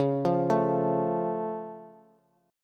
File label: C sharp Augmented down Bass, chord